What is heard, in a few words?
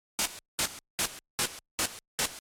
walking